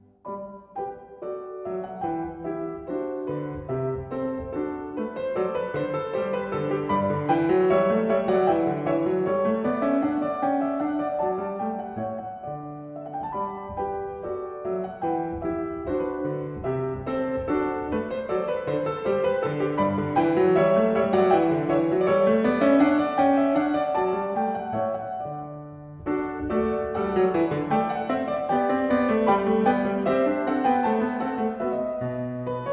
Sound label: gavotte